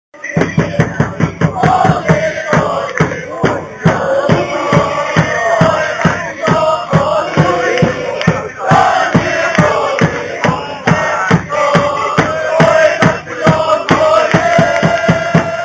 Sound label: chant